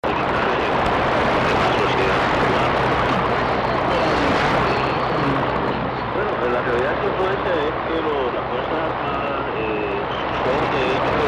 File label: cuban jamming